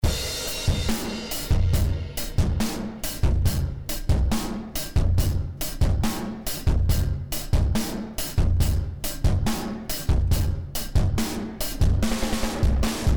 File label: Elysia Karacter Drums Extreme